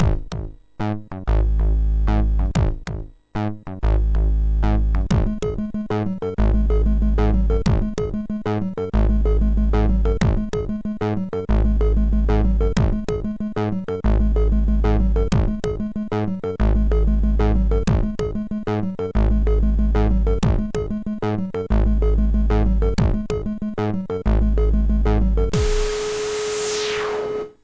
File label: Countdown Intro, sid, MOS6581R3